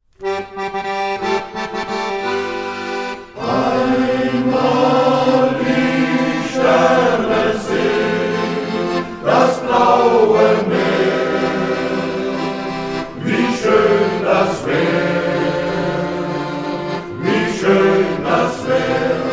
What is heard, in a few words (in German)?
Hafenmelodie